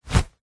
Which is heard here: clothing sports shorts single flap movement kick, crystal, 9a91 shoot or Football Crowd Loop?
clothing sports shorts single flap movement kick